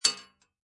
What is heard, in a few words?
Bullet Shell Drop Machine Gun Metal, Machine gun empty shells fall on metal; Impact Sound Effects